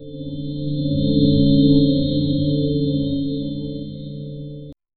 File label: futuristic sci fi ambienc, beep